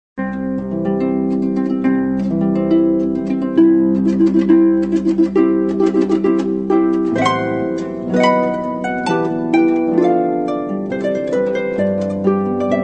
solo harp; mendelssohn wedding march from a midsummer nights dream

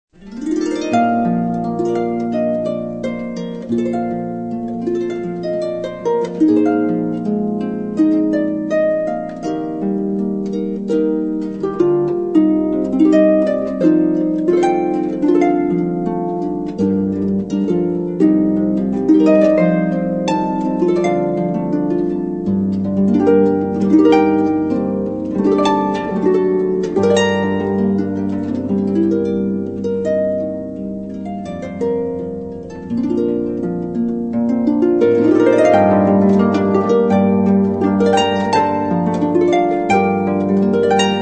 solo harp

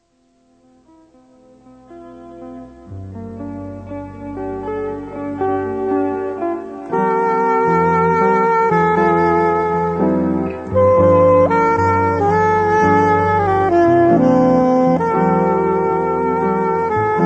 duo guitar soprano

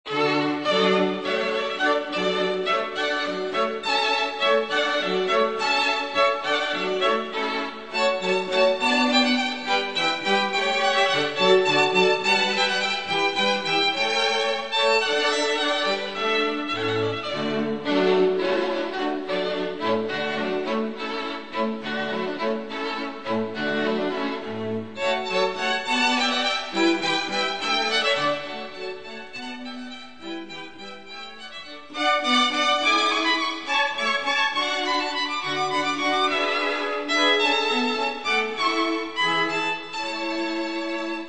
quartet 2 violins viola cello; handel water music finale

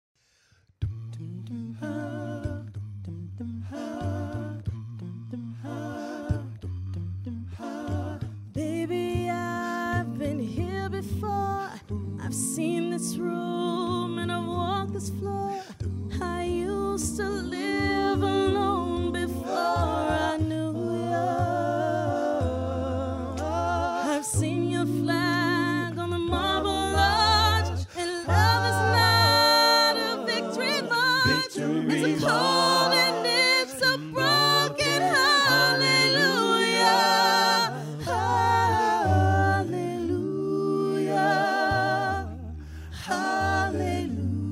hallelujah

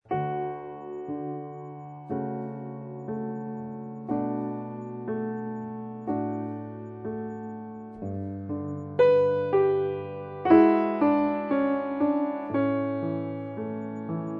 solo piano; bach air on g

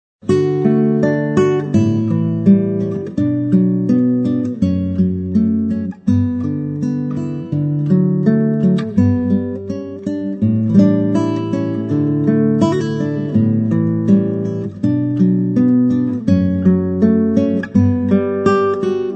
solo guitar; pachelbel canon in d

